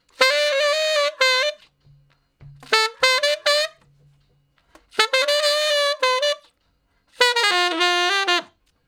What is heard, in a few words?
Ten Sax Straight (F), R&B; Crimson Blue and Fabulous Horncraft 4 RnB; Solos